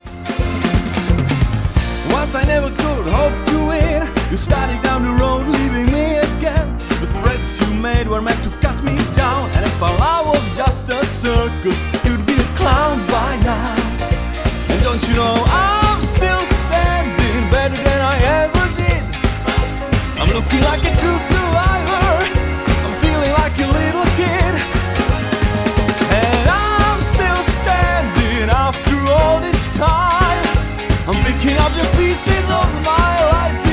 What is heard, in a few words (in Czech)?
ZPĚV; voice over; profi herec; komentar; dabing